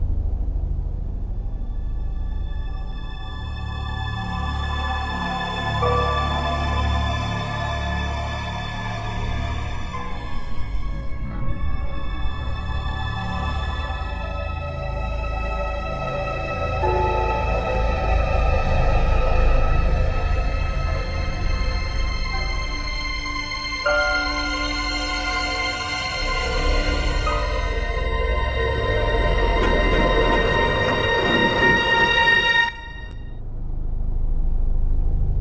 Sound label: tension, ambience music